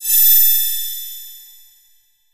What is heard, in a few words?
beam